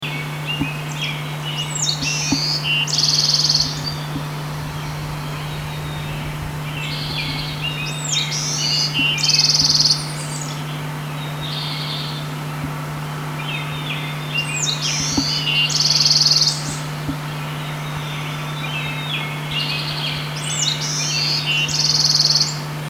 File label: Bewicks Wren